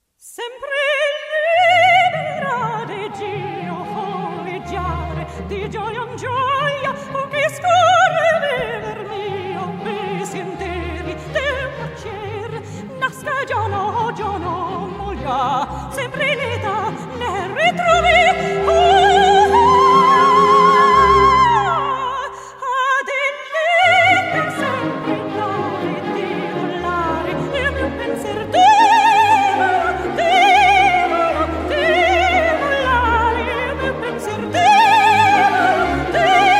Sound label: Opera Singer